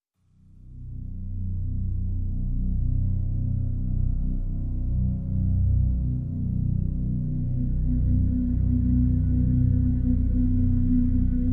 Pads Only

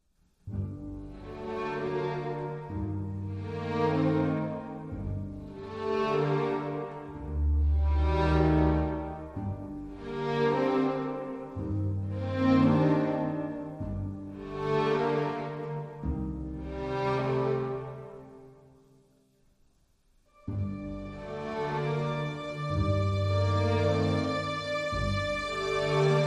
Only Strings

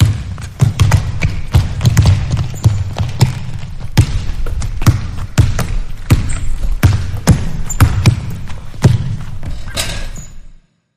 Hoops Gameplay Atmosphere

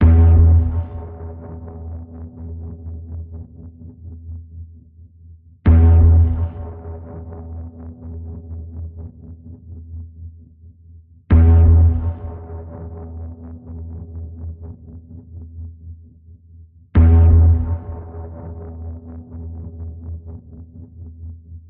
Danger Pulse E, Key of E

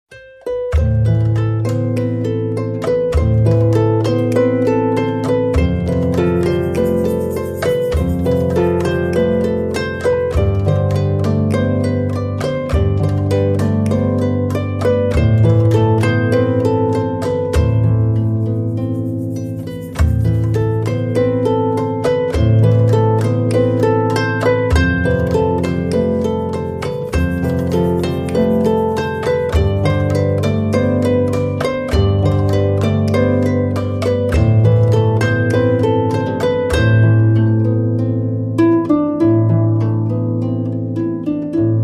No Dulcimer

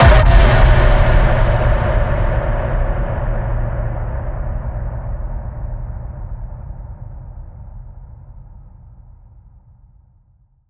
Radioactivity Ping, D sharp

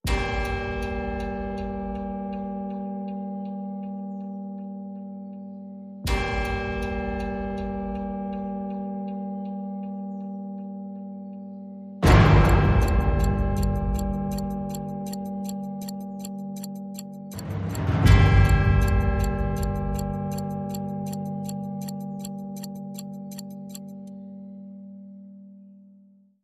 Clocklike G

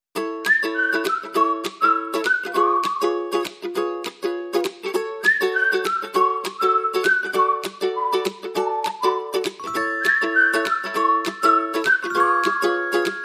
Ukulele and Whistle Only

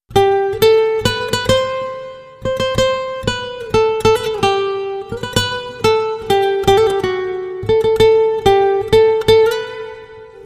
Nostalgia, Guitar Only

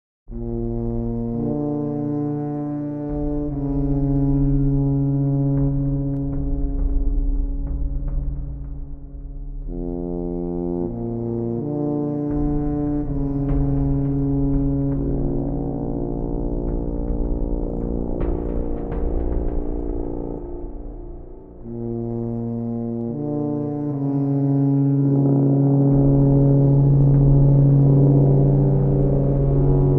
Orchestra

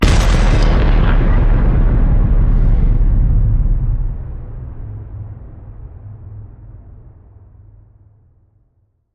hit big cave in

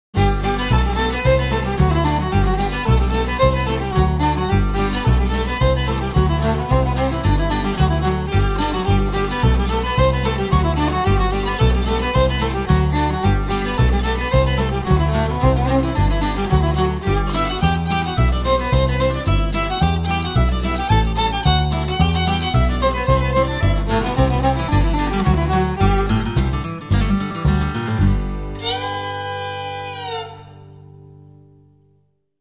Flat Pick Fiddle Tune

